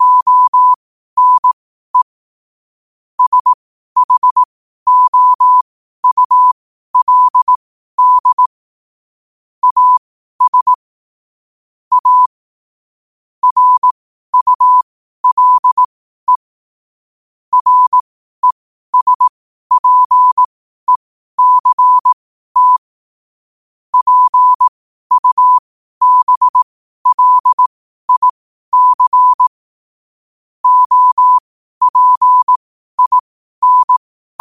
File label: 5 wpm, morse